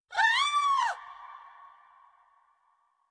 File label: i scream